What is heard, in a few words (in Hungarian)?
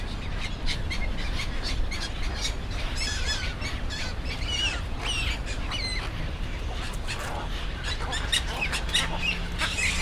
pásztorgém, Arrecife; lanzarote 2017; allathangok